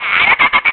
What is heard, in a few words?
Shock; Link getting shocked